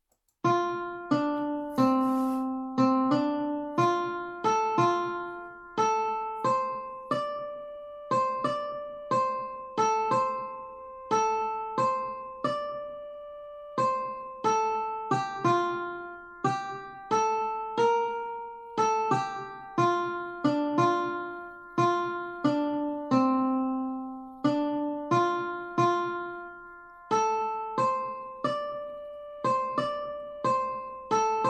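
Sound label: Melody - Practice File, ukulele ensemble; Wild Mountain Thyme; brewkulele ensemble 2022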